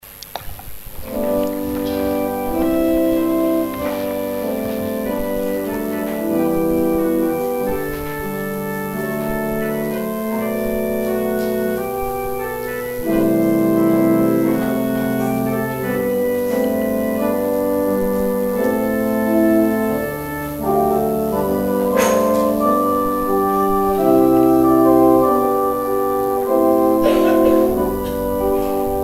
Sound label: organ interlude